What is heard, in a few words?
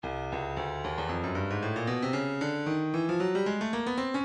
breakbeat 114 bpm kick; Bourbon Street; Stylus Breakbeat Midi Files